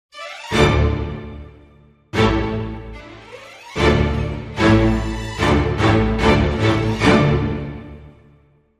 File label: Dramedy Sting Strings